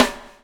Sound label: BUBL, Backbeat; Spectrasonics - Backbeat